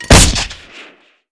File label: mort fire; firearms